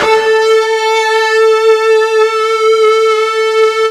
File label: FUZZ A 3, ULTRA FUZZ, Guitar; East West; Steve Stevens Guitar Samples Collection